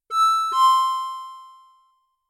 correct answer; 落下音・はねる音

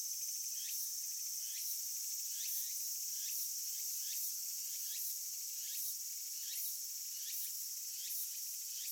semi only

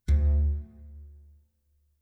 Monitor High

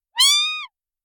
baby cat voice

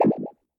pop up; 落下音・はねる音

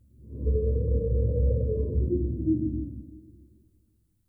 Canyon Wind

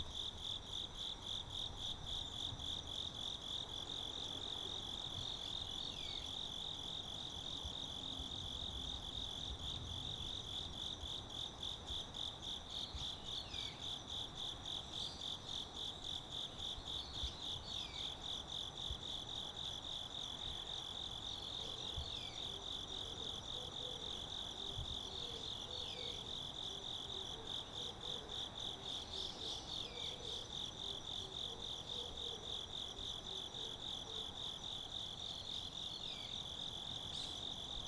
insects and birds wind

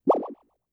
jump up; 落下音・はねる音

